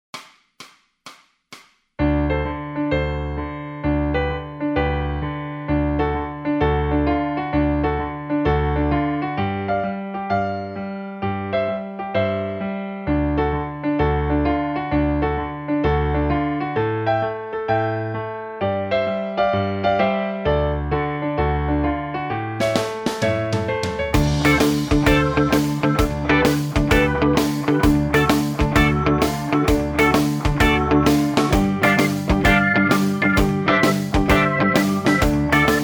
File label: bass blues, backing tracks